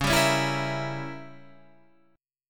C sharp Diminished 7th C sharp down Guitar Standard; C#dim7 strummed; JGuitar